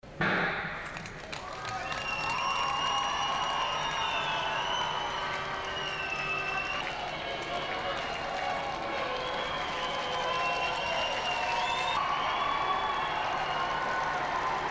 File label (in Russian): vystrel starta i nachalo bega konkobezhcev; Звуки катания на коньках